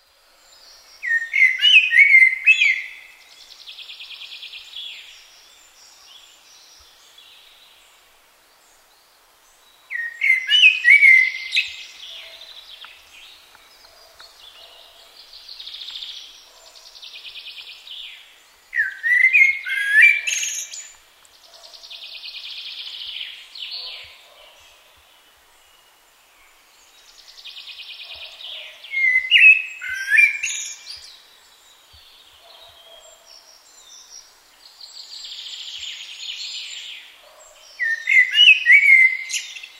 blackbird

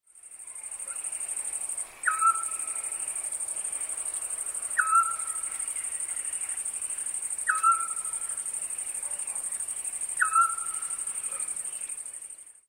scops owl

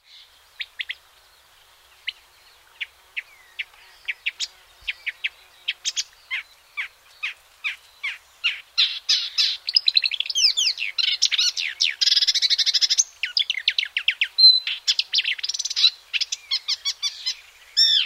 marsh warbler

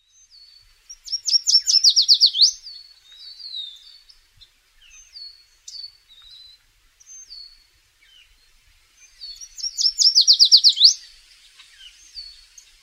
yellow warbler

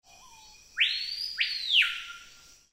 screaming piha

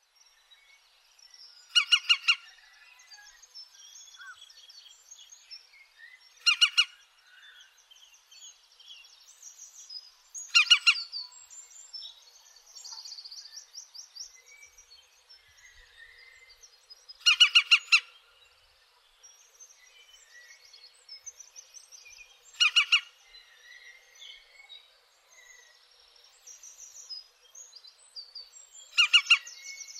green woodpecker

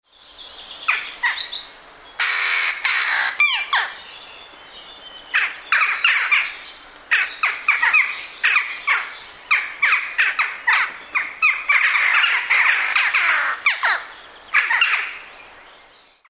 jackdaw

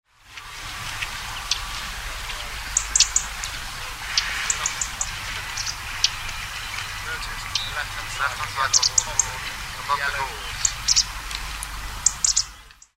dusky warbler

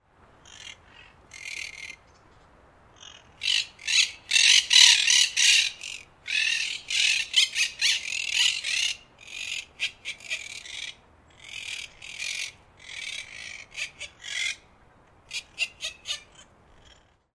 monk parakeet

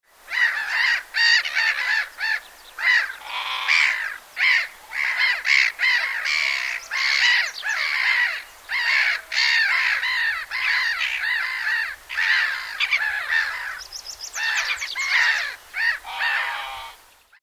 common gull

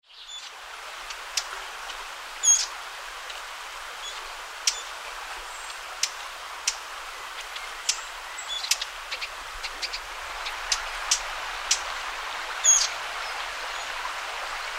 blackcap

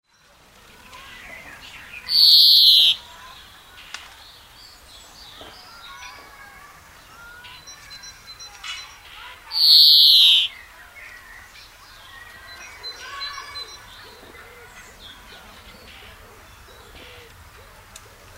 greenfinch

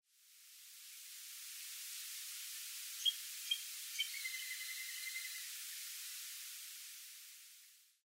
little grebe, nocmig

